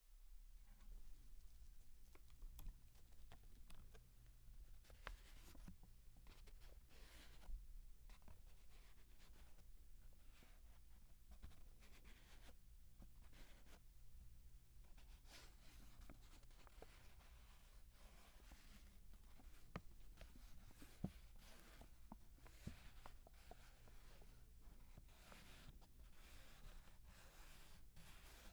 Touching And Moving A Withered Flower

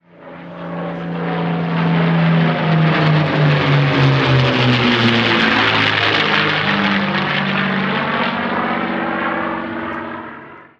Two P 51 Mustangs Pass Overhead

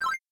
Button Select

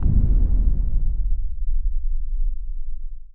Scene Change

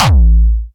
Hardkick

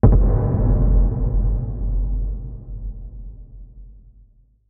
Cinematic Boom Impact Warning

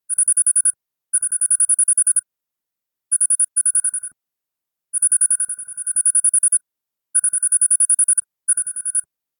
Prompting Letters

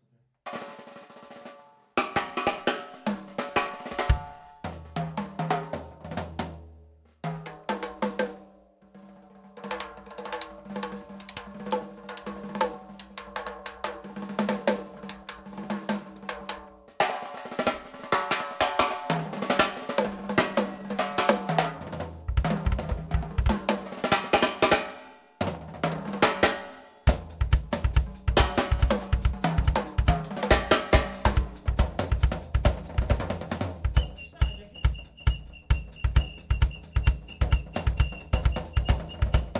Drum solo

